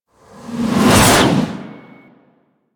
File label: Golem Lift Scythe; Warframe; Attacks